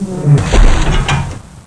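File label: door close; InventorLabs Technology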